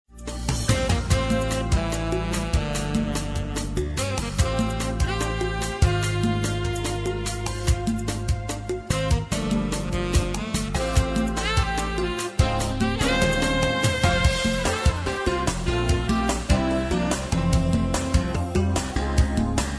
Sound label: jazz pop